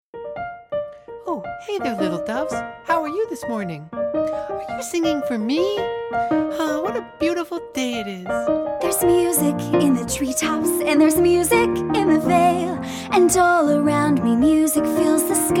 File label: Piano; My Little Pony Friendship is Magic